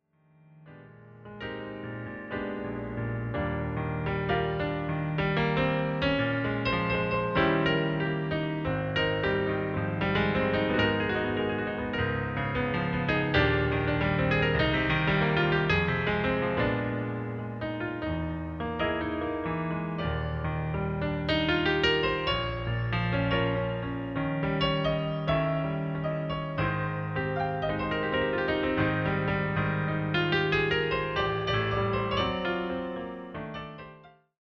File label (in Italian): piano solo